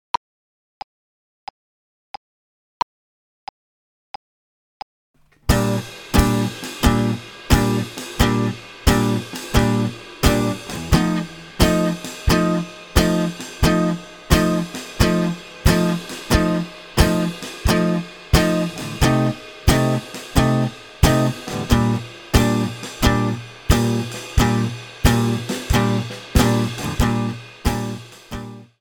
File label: swing slow